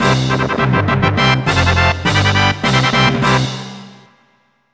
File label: Open Note Door Fanfare; Banjo Kazooie